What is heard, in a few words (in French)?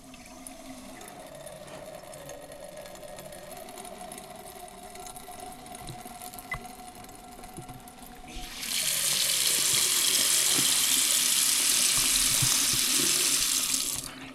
robinet qui coule; Les ecoles